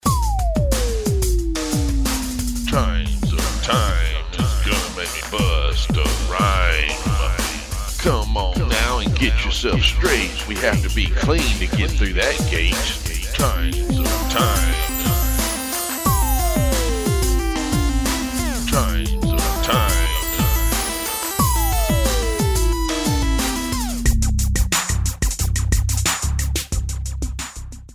chimes of time

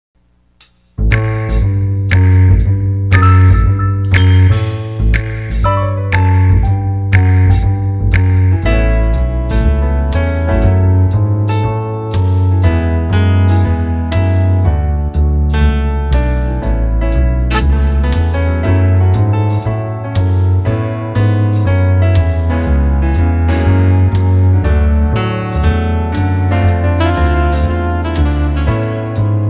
instrumental

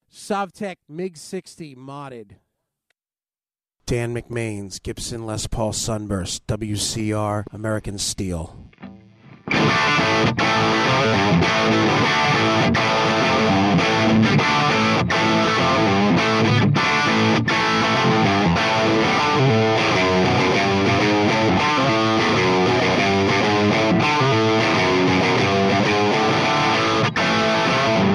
sovtek modded meg 60 bridge

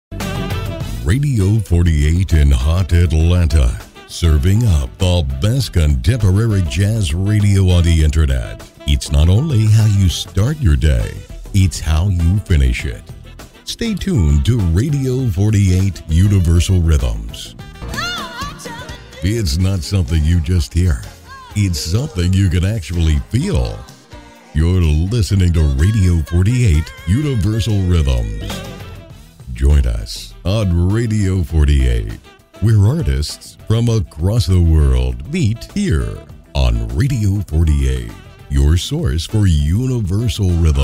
smooth jazz imaging

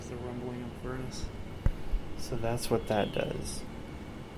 scream

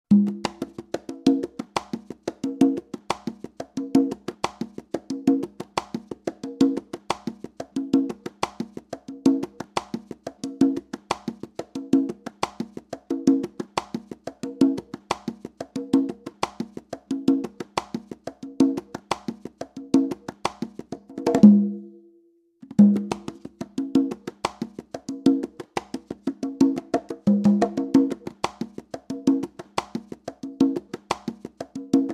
congas samples bpm 180; robert vilera

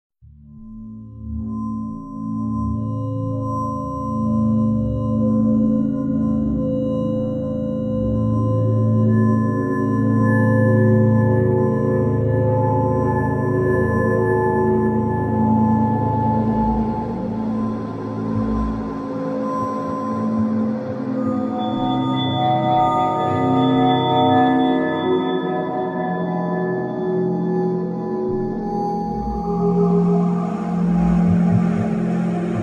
suspense

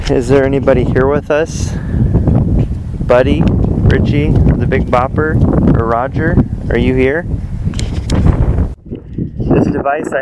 crash

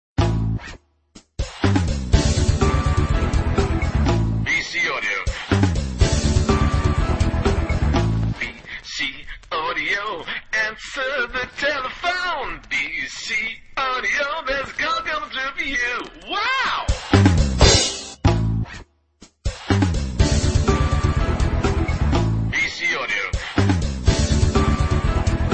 ringtone

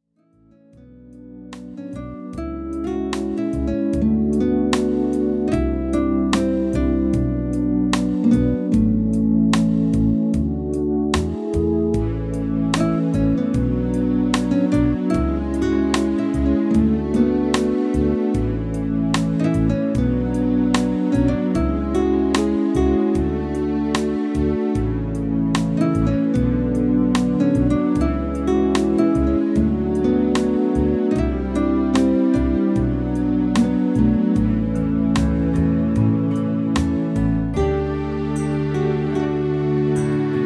instrumental

